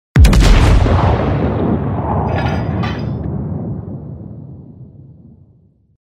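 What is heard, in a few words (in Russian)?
звуки пушки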